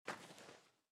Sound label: step sand